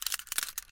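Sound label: sharp reload of a combat pistol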